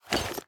equip iron, armor; Minecraft; neoLegacy

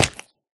jump egg, turtle; mob; Minecraft

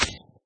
break, leashknot; Minecraft

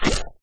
slime attack; mob; Minecraft

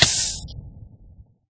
fire hurt, player; Minecraft

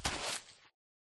walk, turtle; mob; Minecraft

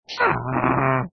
ambient, witch; Minecraft

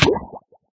drown, player; Minecraft

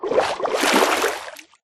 swim, liquid; Minecraft

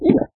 drink, witch; Minecraft

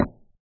wood, dig; Minecraft

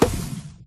hit, shulker; Minecraft

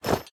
equip diamond, armor; Minecraft

